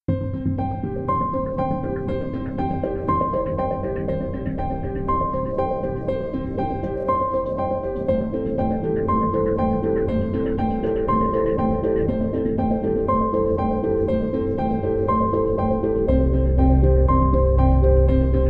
Less Piano

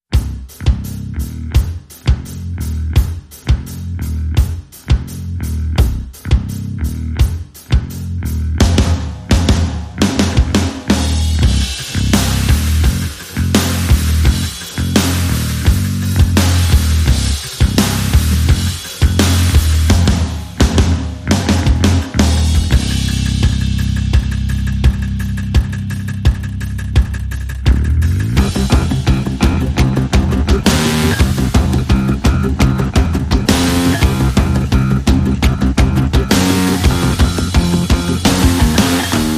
No Guitars

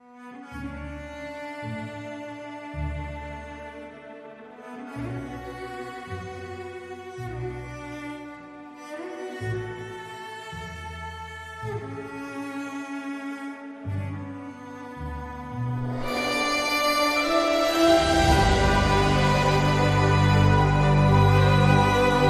Elegant Vibes No Piano

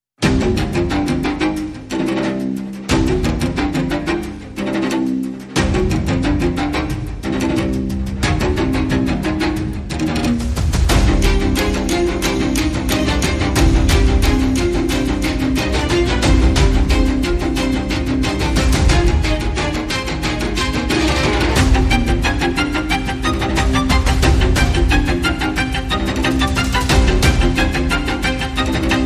Strings and Percussion

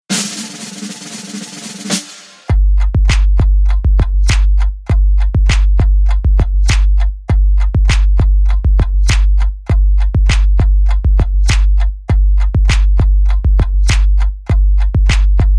Clockers Beat

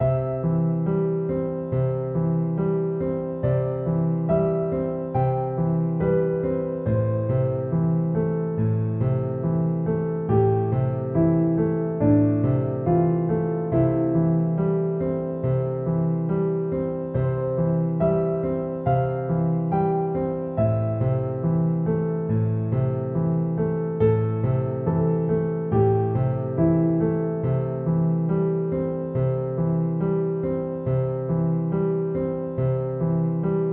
Piano Only

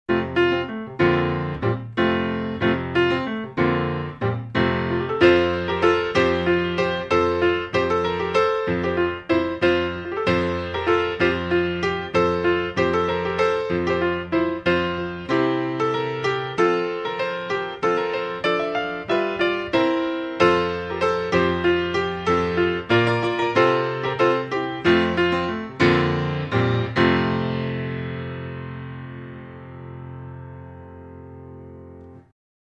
Deck The Halls, Solo Piano

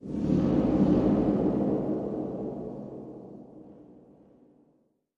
Whispering Balloon

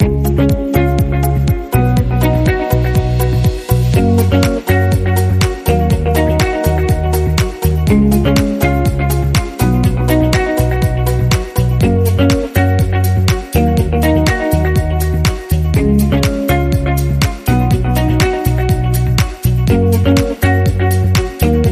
Underscore

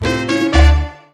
Hit

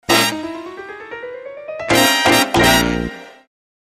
Latin Nights, Sting

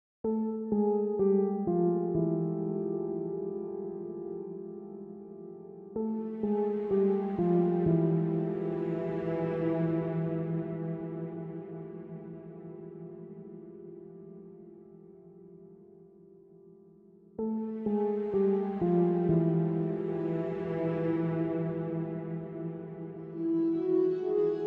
Fur Elise, Additional Instruments

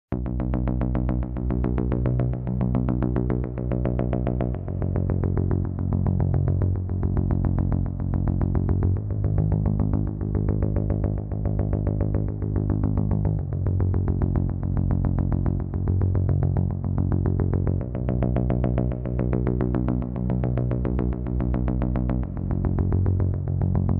Pulse Only

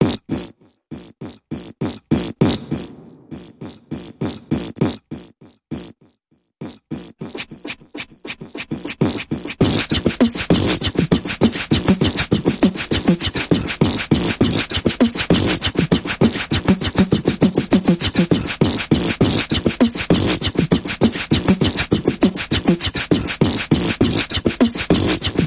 Big Mouth Percussion Variation

